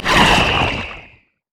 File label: elastic slingshot; Zac